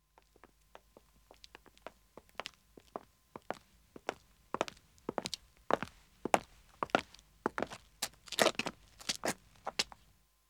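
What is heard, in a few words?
Pavement Walking 2 men approach; Footsteps; BBC Sound Effects Library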